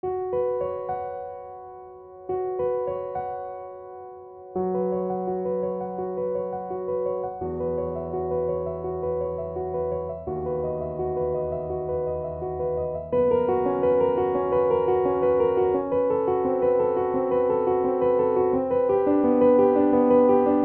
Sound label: Piano